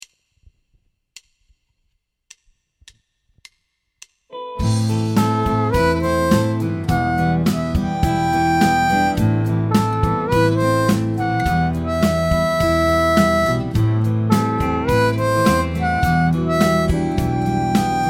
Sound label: G 105 harp